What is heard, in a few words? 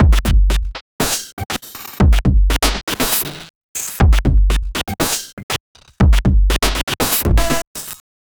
glitchy drum loop